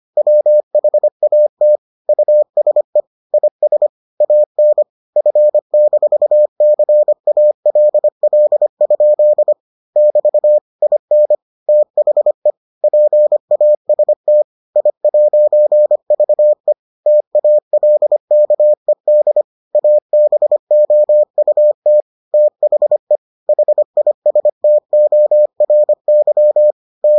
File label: morse